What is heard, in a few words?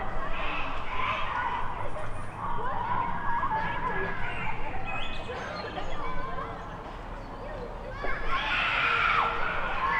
screams